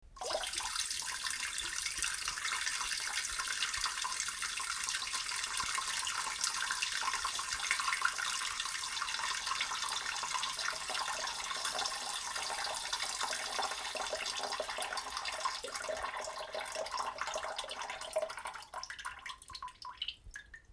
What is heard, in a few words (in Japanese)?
しょーぱちゃち おしっこ